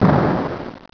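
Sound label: EXPLODE, Explosions; sound effect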